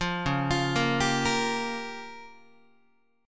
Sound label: Db 6th Flat 5th, down, Guitar; Db6b5 arpeggiated; JGuitar